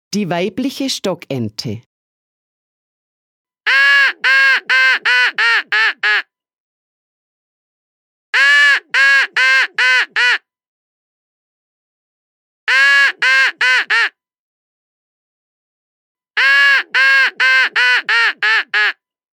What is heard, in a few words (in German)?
Die weibliche Stockente